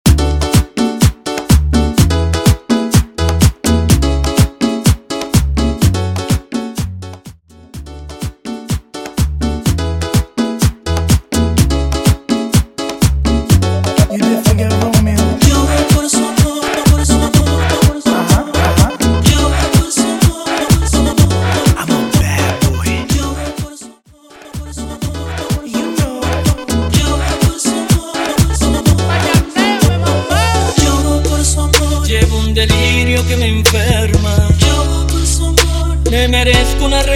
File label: La Demanda Transition Merengue To Bachata 125BPM